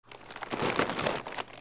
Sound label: GARAGE